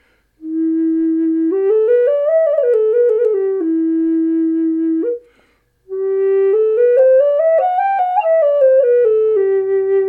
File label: E4 Spruce, flutes